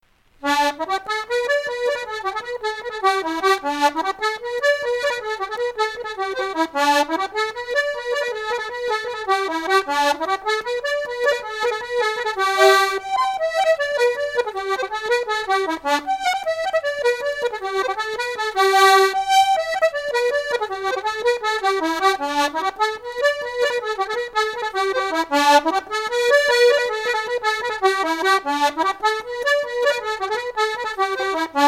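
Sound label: Kerry music, Sliabh Luachra hornpipes; the blackwater set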